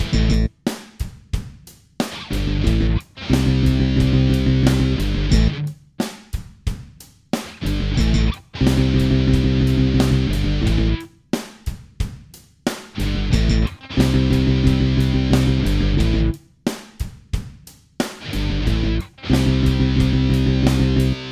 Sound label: Hard Rock Intro